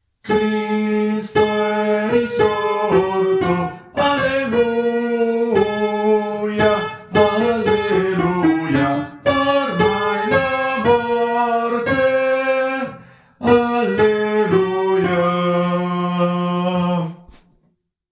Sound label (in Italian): Cristo e risorto tenori